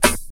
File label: trebly acoustic snare sample D key, steel snare drum sample tuned to the D note